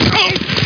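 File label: TACKLE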